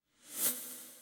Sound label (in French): Woosh objet